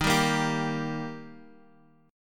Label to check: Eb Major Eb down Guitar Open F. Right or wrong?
Right